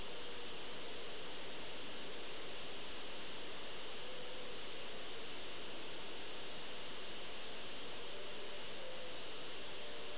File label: guy singing